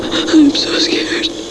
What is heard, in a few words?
scared